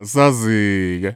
Vocal Chirp, African Voices

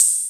Open 909 Hat; EMU PX-7

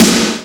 REVERB SNARE; Greytsounds; Scott Peers Sound Engineering

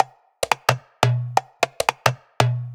BongoLoop; neuro; InsideInfo; Drums

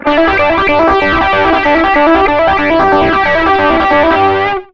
SOLO L, Electric & Acoustic Guitar Loops; USB Soundscan vol.04; AKAI; 120 SOLOS

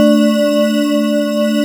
PADY CHORD

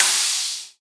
Acoustic Drums - Cymbal Crash; Heavy Acoustic Drums; Antidote Advent

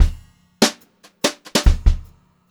Brushed Drum Loops, fill; USB Soundscan vol.39; AKAI

